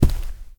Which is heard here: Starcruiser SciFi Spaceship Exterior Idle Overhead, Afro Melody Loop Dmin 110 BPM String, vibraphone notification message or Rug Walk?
Rug Walk